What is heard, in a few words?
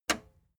plastic button slack release